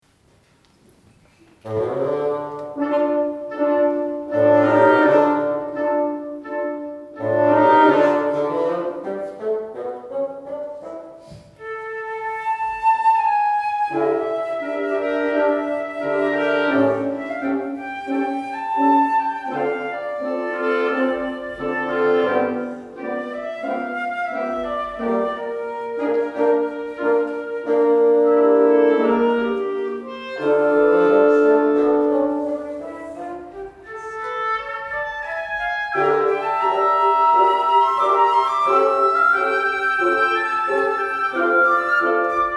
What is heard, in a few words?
Tango, wind